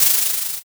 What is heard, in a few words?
pen